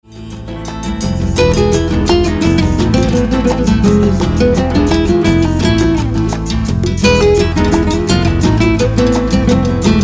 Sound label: spanish guitar latin